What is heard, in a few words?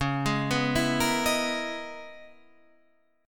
Db Minor 13th Db; Dbm13 arpeggiated; JGuitar